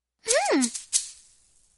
small dance aloha; hawaiian

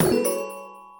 spin button; PushGaming; Peter And The Lost Boys; peter Pan

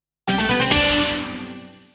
block break; medallion; Fantasma

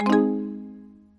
reg win popup; Skillzgaming; fruit Blast 92 Desktop

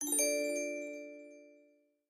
lava stone multiplier; Skillzgaming; battle Mania Desktop

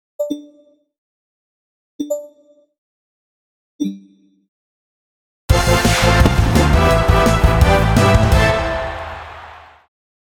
base Game Sound; rugby Star Deluxe

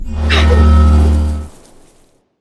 girl spell; adelia The Fortune Wielder; Foxium

